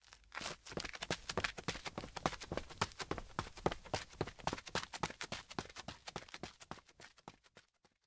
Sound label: Run Outdoor; LOOPERS